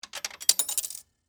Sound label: Pay Phone Insert, Technology, Telephone, Coin, Insert, Into, Pay, Phone, Rejected, Dime